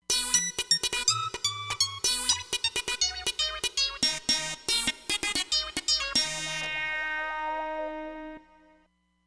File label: Roland Fantom XR